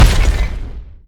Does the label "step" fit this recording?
Yes